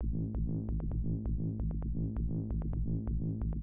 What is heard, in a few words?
Bass 2 Riff A, Belgium Beats 132bpm; Big Fish Audio - Old Skool House; OSH Construction Kits